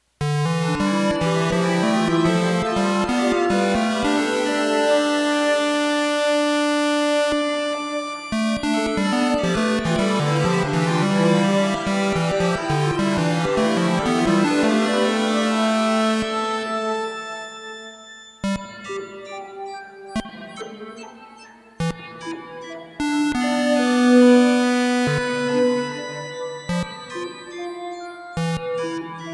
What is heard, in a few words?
ARDUINO KEYBOARD